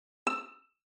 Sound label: vn pizz